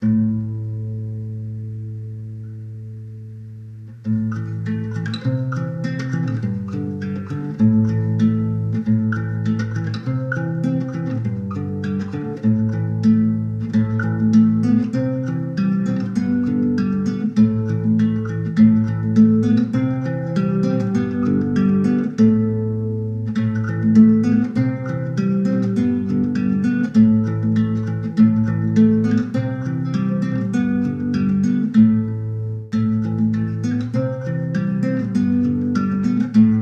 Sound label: Guitar song